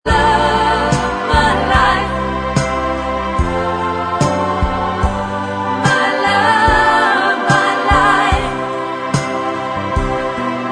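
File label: backing vocals, backing tracks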